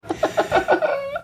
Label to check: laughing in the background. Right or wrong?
Right